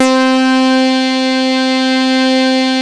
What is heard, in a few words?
CS-15, BASSES; Masterbits - Vintage Voltage; VV BASSES